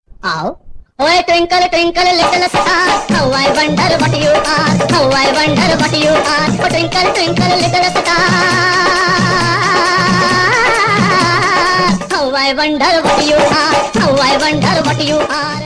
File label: twinkle winkle funny; children